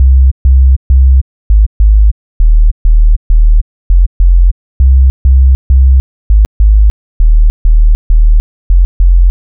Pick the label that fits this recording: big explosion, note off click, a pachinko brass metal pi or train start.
note off click